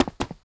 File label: Mob raid run; Monster; 레이드; 혈면귀사마벽